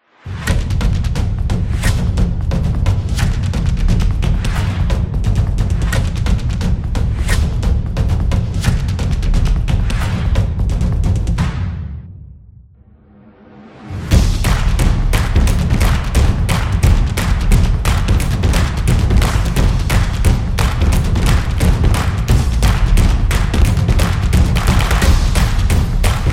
Stomps Beat

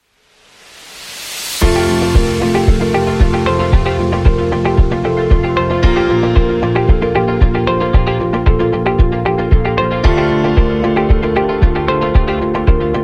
Corporate Inspiration; corporate promo

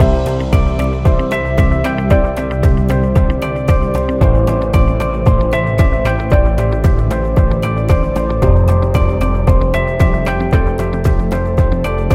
Podcast Loop Corporate

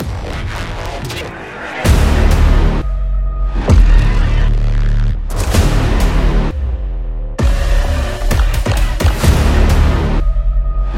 Trailer Suspense

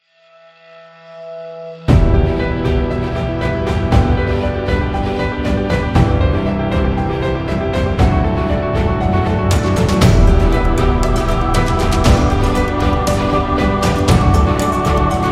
Modern Epic, epic music; epic music vol 1

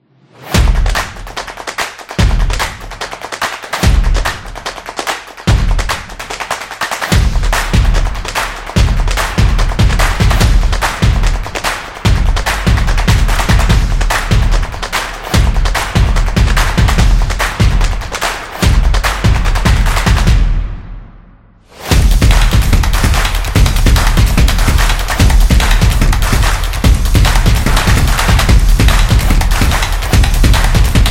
Soccer Music; football promo

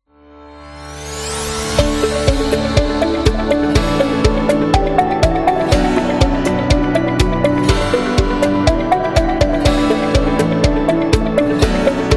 Epic Presentation; eco-friendly

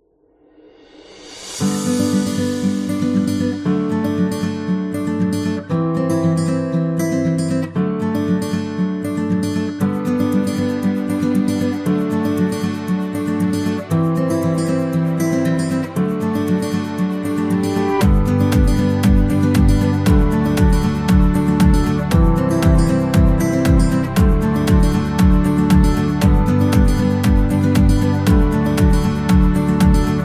Guitar Inspirational, happy music

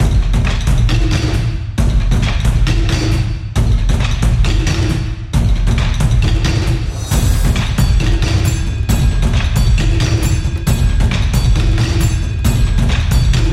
Japanese Percussion; tribal dance

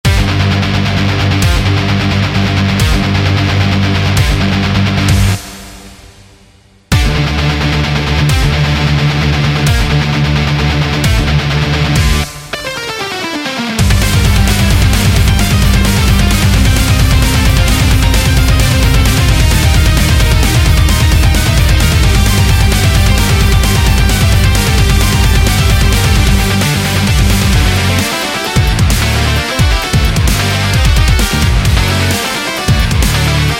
Rock, epic sport metal; Vivaldi-Rock

